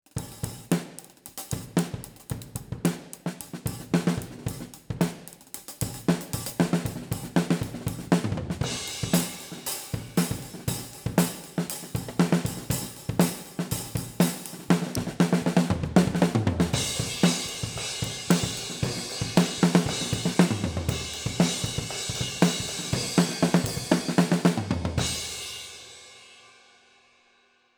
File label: Kick, Head On 20cm OH